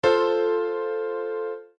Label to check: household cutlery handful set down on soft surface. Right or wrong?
Wrong